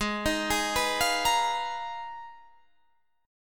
G sharp Minor 6th Add 9th G sharp Guitar Standard, chord; G#m6add9 arpeggiated; 16,18,18,16,18,18; JGuitar